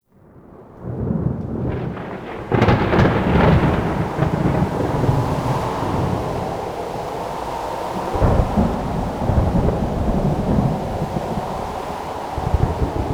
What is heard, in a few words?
Thunder; Warframe; Jade Shadows; Quests; Escape Cinematic